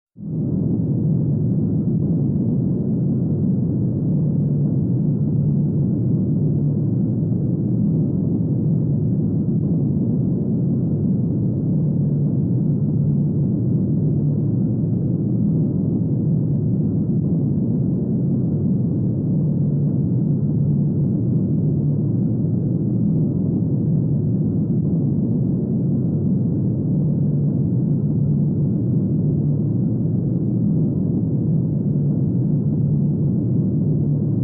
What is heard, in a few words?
Battlestar Rumbling; BSG